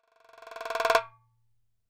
darbuka 2 FX, Boucles audio de darbouka - samples de percussions